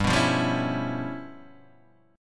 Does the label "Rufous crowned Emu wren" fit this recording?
No